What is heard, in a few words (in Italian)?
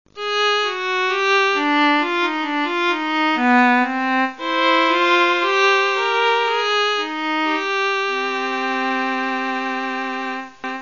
viola; primavera